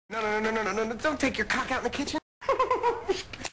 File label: giggle